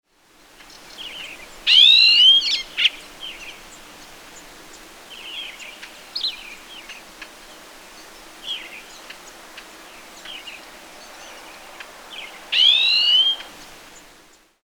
Violetbacked Starling; eBird

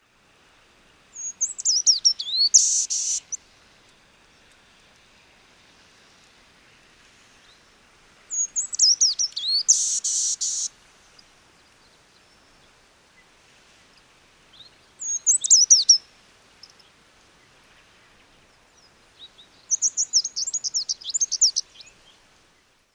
Fairy Flycatcher; eBird

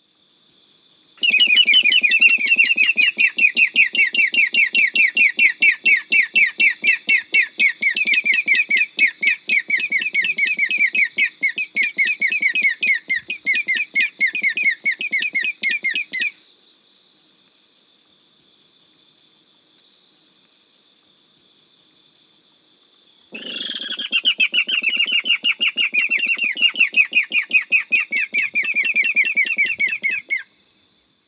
African Rail; eBird

